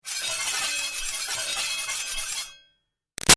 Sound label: Machine Shearing Sweetener Sword Blades Spin; Machinery